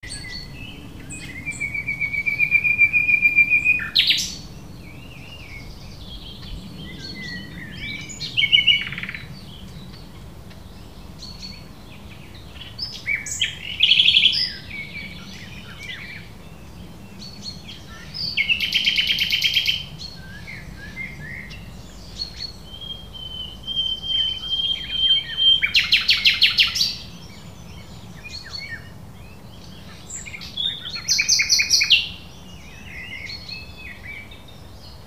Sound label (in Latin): Luscinia megarhynchos; SETE